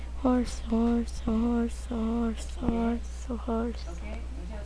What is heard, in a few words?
horse